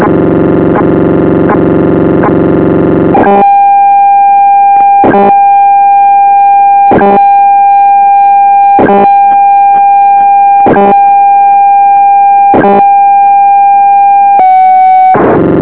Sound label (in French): ERMES, signaux